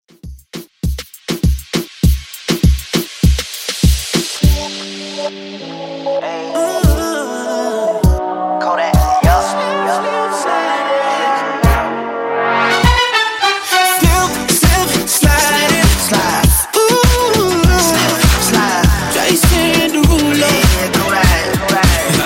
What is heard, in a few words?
Reggaeton, 100 Bpm